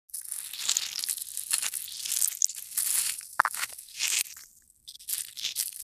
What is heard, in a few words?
sound of tiny pebbles, texture